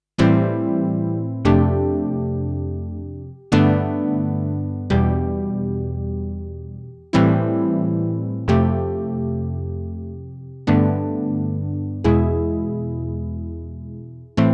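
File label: Yamaha SY99 Pluck dry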